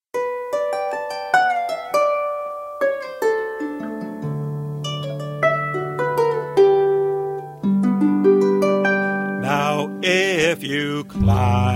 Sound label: eagl harp